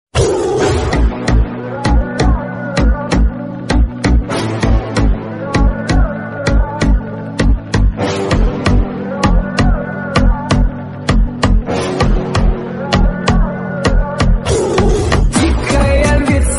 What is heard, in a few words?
attitude remix; Tiger 3